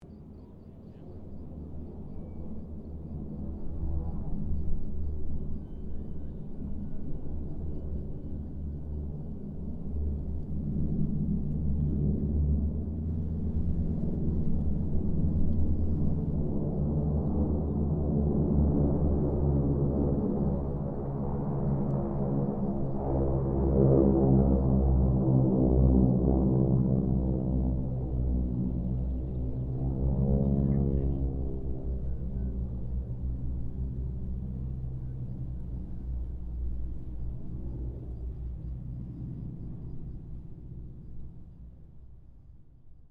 Harvard squadron 4 pass distance, stereo; Mixed aircraft squadrons; Airplanes; Vehicles

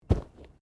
Bodymovement Fall Light

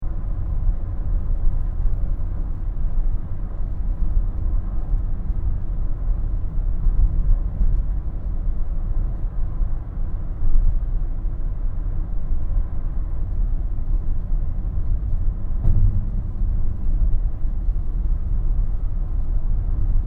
Car Toyota Kluger interior driving loop; Toyata hire car

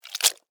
Scorpion Tail Sting, Stinger, Attack; Animal, Scorpion, Tail; Wet, Strike; Arachnid, Insect, Bug; Scorpion Sound Effects; BlastWave

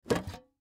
Tin Box Remove Lid Open; Tin Box Empty Remove Lid; Lids; Foley; Objects; Blastwave

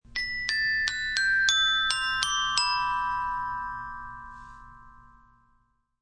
Xylophone Melody, Pretty xylophone melody; Mallets; Sound Effects:Musical

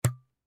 Packaging Tape; Tape Dispenser Spring; Industry; Blastwave

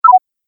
Sine Wave Confirmation, beep

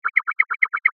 Squeaky loop, Squeaking Object, Fast; Miscellaneous Toy; Toys

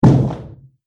Body Slam Impact, wrestling and struggling; Wrestling And Struggling Sound Effects

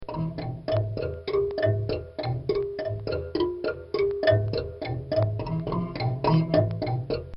Marimbula

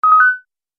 Multimedia Event Notification, beep sound effect

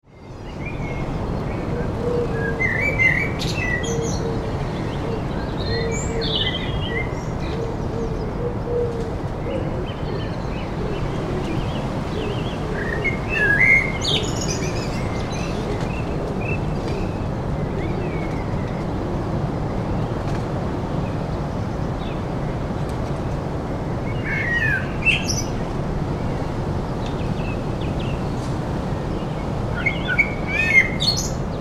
Wind in Trees Heavy with Birdsong; Background tree trunk squeaks; Weather; Tom Hutchings

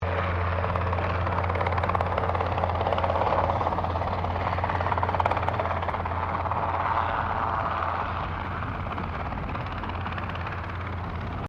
HELICOPTER 1982 ROBINSON R22 PASS OVERHEAD HIGH; Helicopters; Vehicles

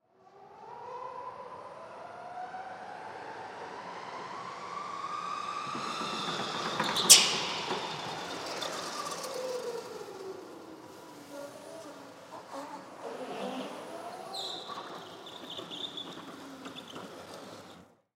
Go Kart Drive Up Brake Slow Electric; Go-Carts; Vehicles; SoundEffects-Blastwave; Sports

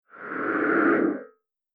Moon Dust Whoosh

